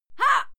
female fight scream short; schreie; menschen

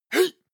male attack shout short; schreie; menschen

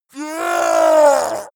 goblin scream pain; schreie

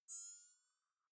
night-vision activate

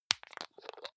hygiene small screw cup open medium; haus-garten; badezimmer

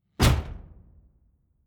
sci-fi shot rustle

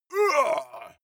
male fight moan; soundpool

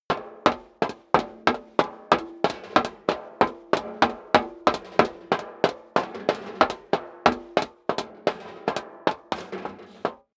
step high heels metal rattle run sequence; schritte

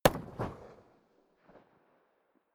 rifle ak-47 exterior fire single distant; comedy-cartoon; Pistols and guns; pistolen-gewehre

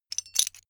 metal pieces clatter short

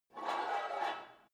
take off weight barbell quick, fitnessstudio

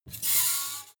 metal screech phaser fast

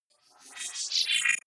wobble swell robot; sweeps-swells

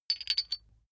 metal chain medium handling

